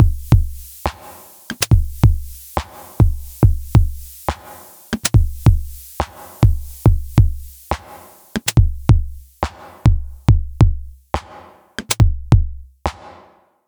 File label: Bpm140 Break, Dubstep, Drum Loops; Wind